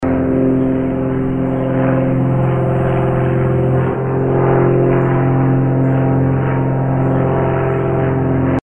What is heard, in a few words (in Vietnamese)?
Tieng may bay canh quat bay tren bau troi